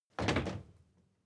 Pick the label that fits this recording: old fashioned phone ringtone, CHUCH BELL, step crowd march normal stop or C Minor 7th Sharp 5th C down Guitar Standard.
step crowd march normal stop